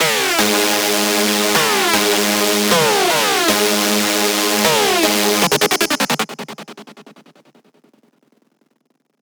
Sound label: screech loop G# min 155; Music Loops; GhostHack